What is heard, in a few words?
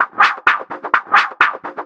FX Loop 128 BPM, House